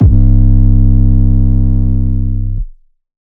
808 (C), Hip Hop and Trap; Ghosthack; 808s

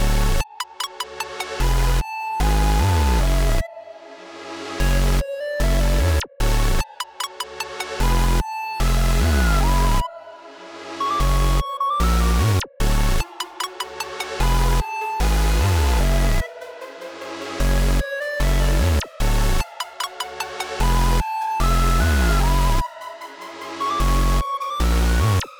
150 BPM F# Min, Future Bass, Melodies; Believer

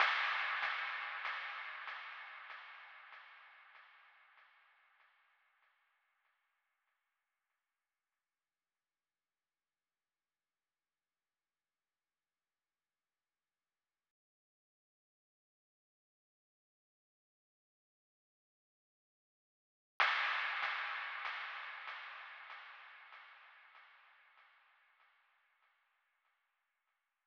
96 BPM E Min Impact FX; Cymatics; Infinity Melody Collection; Avarice

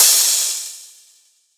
crash one shot, Cymbals; GhostHack

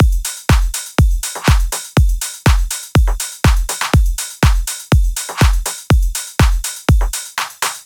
Deep House 122bpm Full; EDM Drums; Drum Kits; Stems; Ghosthack; Ultimate EDM Drums; Deep House Kit 10

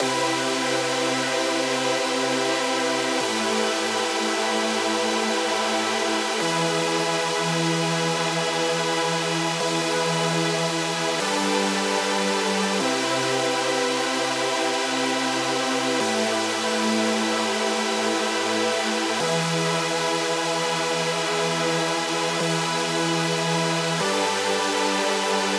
150 BPM E Min Chords, Future Bass; Cymatics; GHOST (Beta Pack); Destination

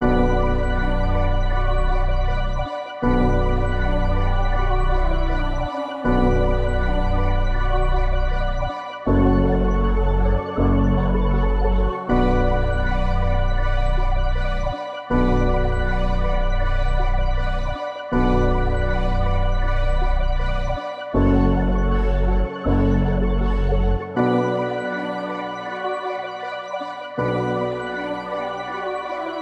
159 BPM B Min, Melody Loops - Trap; Cymatics; Mystery Pack Vol 4

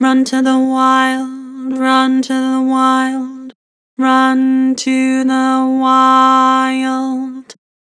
Vibrato Run to the wild, Harmor Vocals

